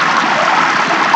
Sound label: GURGLE; CMI Sound Library Rev 1.3